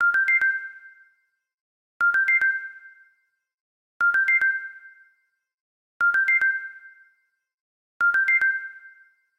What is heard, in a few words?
Flow tone 5 times; Fujitsu F-42A